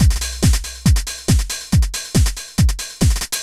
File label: techno 140